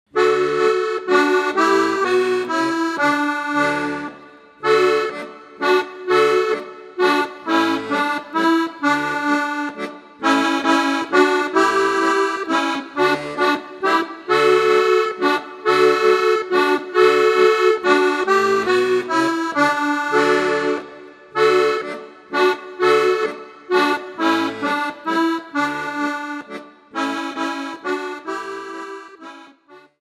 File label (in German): kuckuck kuckuck rufts aus dem wald inst; Jahreszeit Fahrtenlieder